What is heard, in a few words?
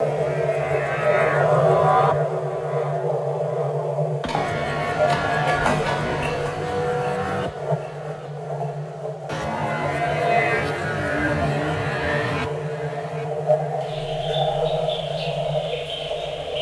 amb power Off; PS Vita